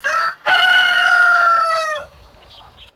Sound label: close up rooster crowing; birds